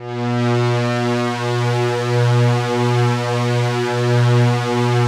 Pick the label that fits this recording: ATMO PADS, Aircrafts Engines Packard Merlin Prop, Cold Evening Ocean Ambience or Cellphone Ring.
ATMO PADS